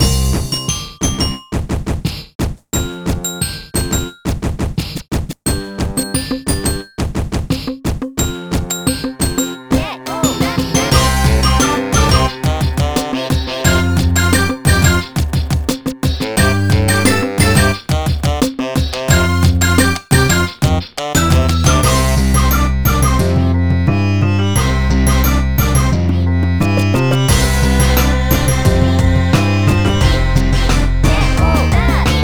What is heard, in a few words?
Music DESERT BPM88; Super Scribblenauts 2010 NDS